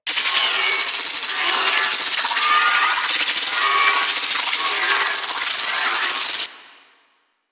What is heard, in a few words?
Train Drone Sparks Loop; ambience; props; loops; Warframe; Venus Open World; Venus Landscape